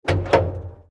damage vehicle; World Of Warships

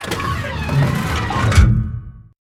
gun reload; World of Tanks

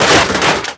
explosion metal armor detail; Explosions

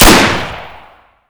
Inf Weapons; Red Orchestra 41-45

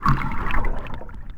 under water start

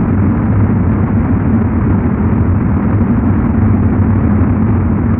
Plane Speedbrake Wind; DCS

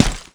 grunt stone run

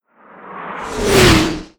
artillery incoming, Explosions; Call of Duty Modern Warfare

